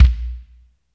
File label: BD SOFT; drum; BASSDRUMS